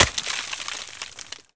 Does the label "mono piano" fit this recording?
No